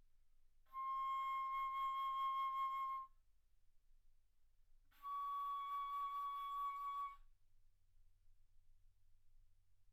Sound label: Bass Flute ff C6 Db6